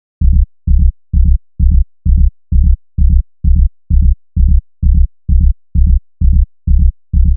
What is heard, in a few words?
Bass Loop 130 C#; Techno; Ghosthack; Free Techno Sounds 2024